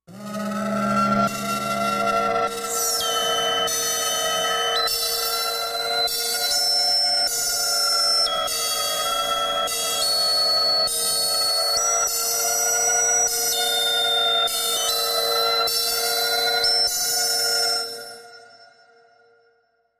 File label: Dentist Alarm Machine, alarms